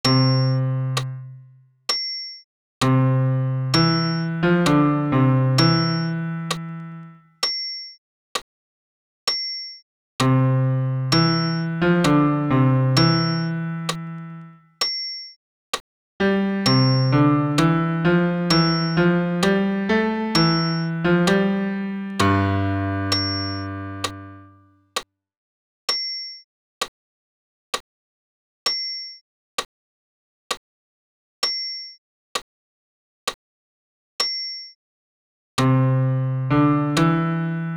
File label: Agnus bas pian seul